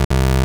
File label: error, menus; sonic-radiance